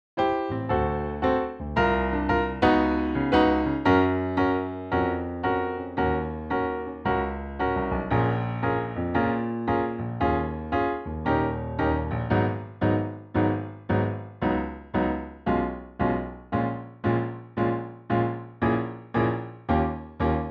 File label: Piano; Copa; Singing Audition Pieces